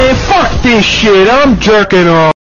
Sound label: zombie